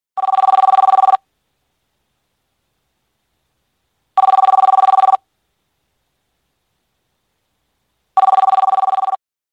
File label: office phone ringtone; android